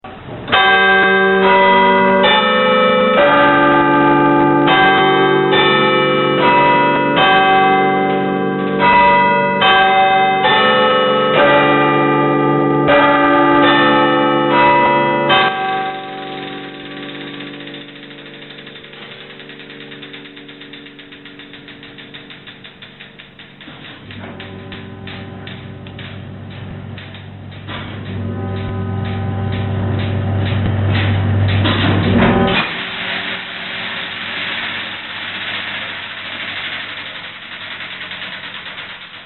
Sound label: clock chamber